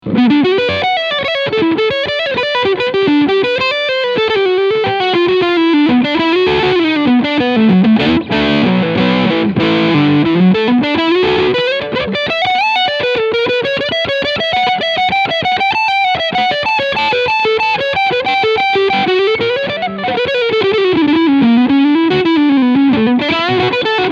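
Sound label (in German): PRS Custom 24 10 Top Leadsound Schalter Position 5, E-Gitarre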